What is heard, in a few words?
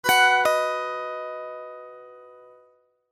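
ring ring beautiful message tone ringtone; sms ringtones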